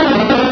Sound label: cry